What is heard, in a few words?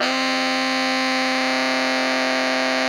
TENOR GROWL C 4, Saxophones; Greytsounds; Philip Wolfe David Crozier's Saxophones